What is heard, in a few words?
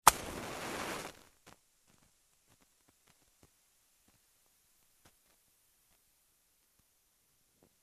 Match light up